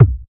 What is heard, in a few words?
nice kick b key, Royality free steel kick drum sample tuned to the B note